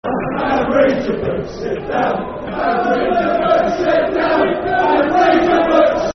Sit Down And Read Your Books, Football Chant (Soccer Song); Watford Wfc